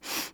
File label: Human Female Sniff Single Sniff